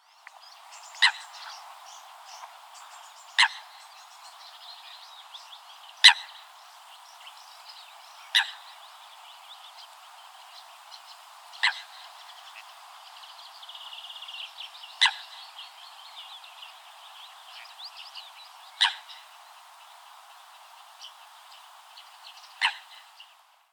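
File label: lysuha golos, coot voice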